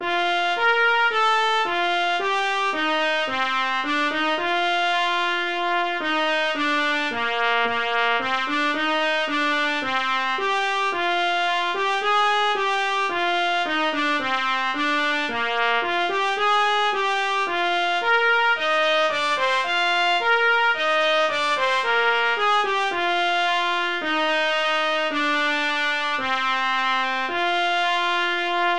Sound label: Carillon